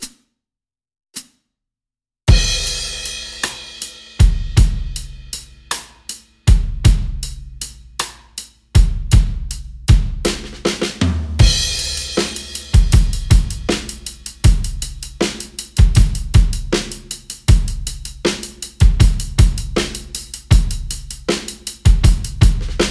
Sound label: pop